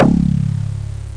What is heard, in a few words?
FM Bass; SynBass